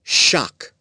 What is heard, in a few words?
shock